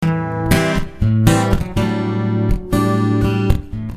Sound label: Punchy Am7,D